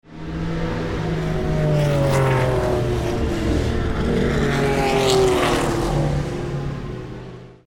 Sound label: 1969 Porsche 911 and 1971 Ferrari Daytona, engine